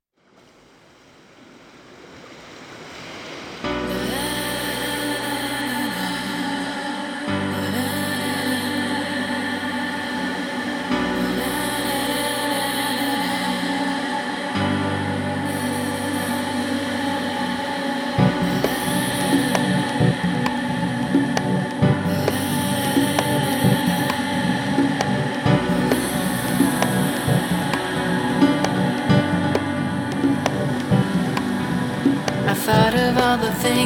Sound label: acoustic